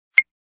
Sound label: Keypad; Kyocera; KX2 Koi